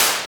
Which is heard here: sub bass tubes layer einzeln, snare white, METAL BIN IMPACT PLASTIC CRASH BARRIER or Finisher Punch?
snare white